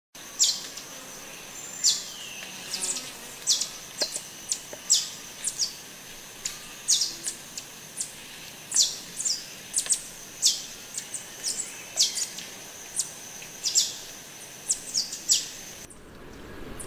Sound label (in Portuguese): canto da saira negaca